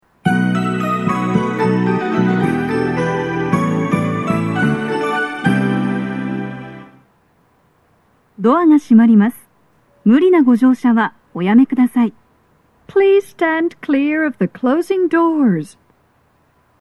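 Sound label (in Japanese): departure, 発車サイン音; 1番線 西船橋・東葉勝田台・津田沼方面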